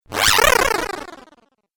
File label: Cool Fx Video Game; Video Game Sound Effects